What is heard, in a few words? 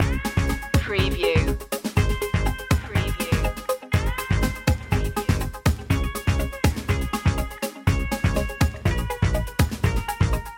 bubbly loop